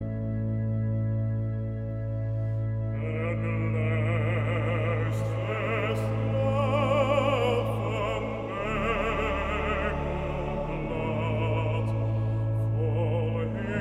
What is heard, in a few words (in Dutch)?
Koor gemengd met solist man